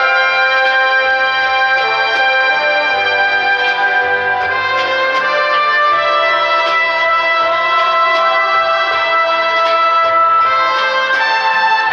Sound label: horns, Loops